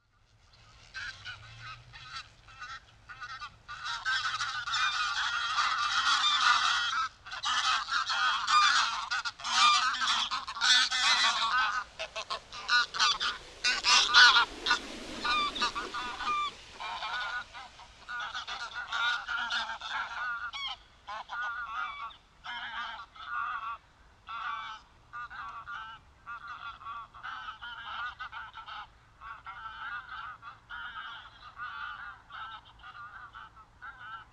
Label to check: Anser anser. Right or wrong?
Right